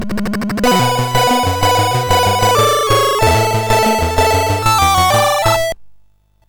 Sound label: sid MOS6581R3, GAMES; Continental Circus